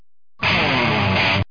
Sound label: riff